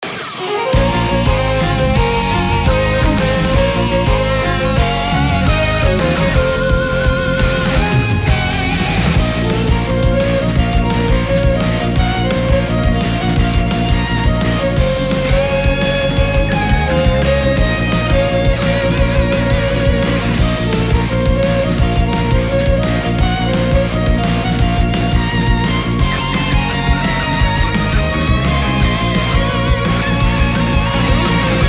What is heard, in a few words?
Battle Decision